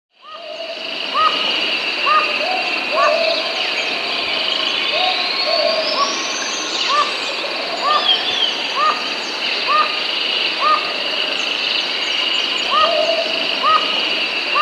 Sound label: Chestnut-Tipped Toucanet (Aulacorhynchus Derbianus), Andean Birds; S. America